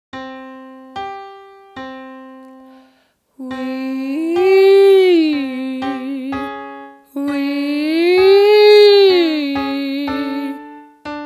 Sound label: sliding wee ascending, vocal exercises